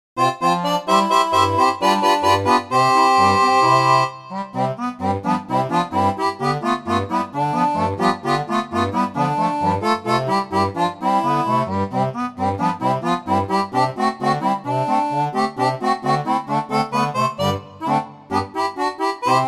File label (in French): orgue barbarie